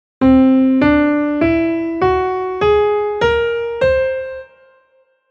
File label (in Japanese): 全音音階音源